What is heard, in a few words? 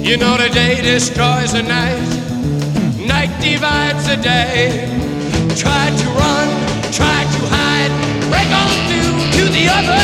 break, music